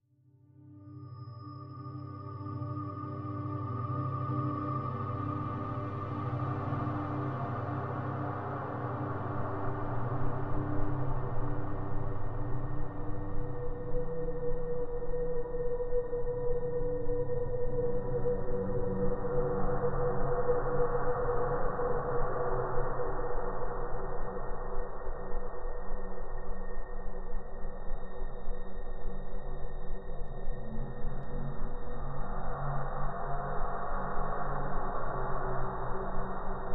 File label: suspense; DayZ-Epoch; dayz sfx